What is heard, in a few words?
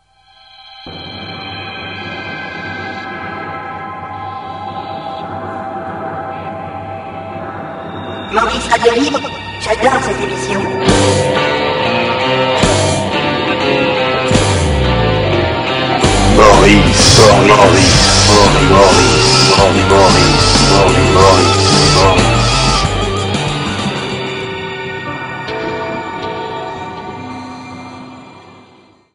jingle